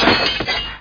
block; DKEDEMO

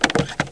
CHASIS; CD Action

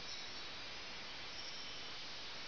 chimes; 12000 Graphics Pack - A Complete Graphics Collection For Your PC

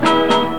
riff; Virtual Graceland

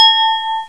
message; Herrscher

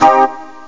chuk fuzz minor

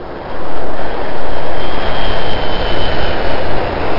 jet

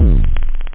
pumm, drums, technobd

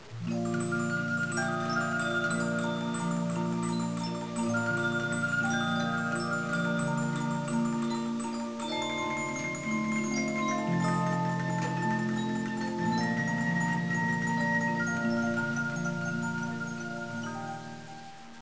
snow

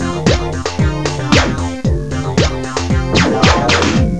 BGM; PATRIS

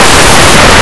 book; Blue Heat

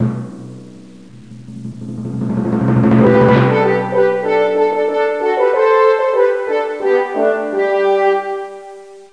deer music

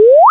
Sound up; WINDHUHN

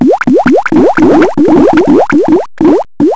long bubbles

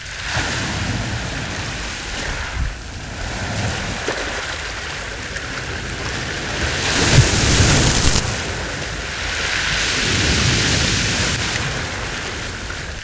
waves

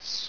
Arrow Flight; Castles - Siege and Conquest

